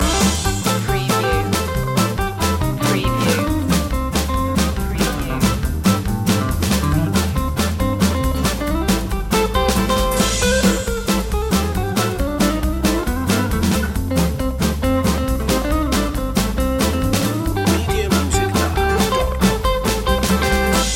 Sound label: stomp loop